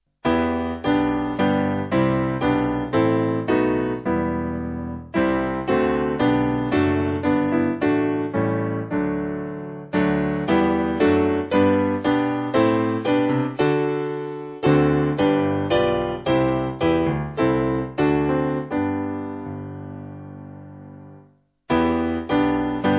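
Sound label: Piano, Music Backing Files for Churches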